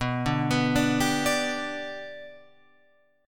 B Minor Sharp 5th, Guitar, Open F; Bm#5 arpeggiated; 11,9,11,9,10,9; JGuitar

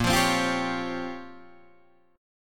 Bb Minor 6th Add 9th Bb down Guitar Open D

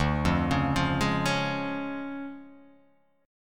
D Minor Major 7th Flat 5th, D

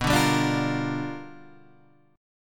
G 7th Sharp 9th B chord

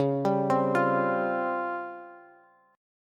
Db Major 7th Flat 5th, Bass; DbM7b5 arpeggiated; JGuitar

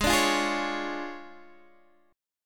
D Minor Major 7th Flat 5th, G sharp

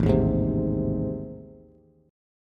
A Minor Major 7th Flat 5th, Bass; strummed; AmM7b5; JGuitar

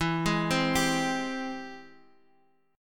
G sharp Augmented Major 7th E

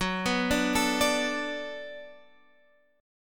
B Minor 7th F sharp, chord

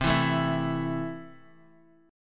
C 6th C down Guitar Open G

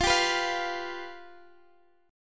F Suspended 2nd Flat 5th, Guitar, Drop D

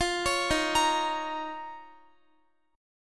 D sharp 7th Suspended 2nd D sharp, Guitar; D#7sus2; arpeggiated; x,x,17,18,15,18; 4F,4A,5C,5G,5C,6E; chord shape

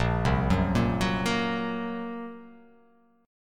A sharp Suspended 2nd Sharp 5th

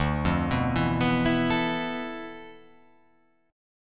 D Minor 6th Add 9th D

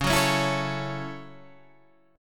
F sharp Minor 7th, guitar

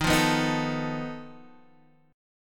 B 7th Flat 5th D sharp, guitar chord

